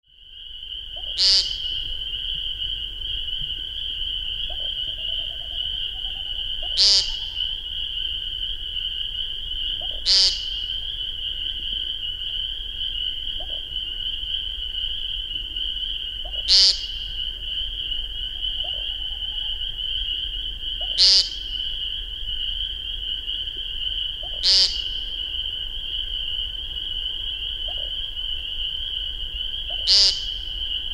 American Woodcock

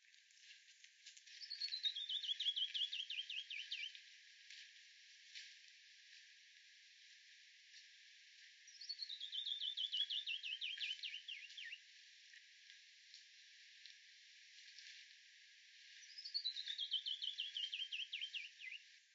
Canyon Wren Songs

